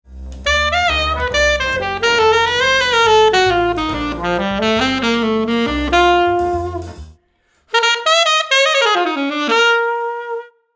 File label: Alto Mouthpiece D, saxophone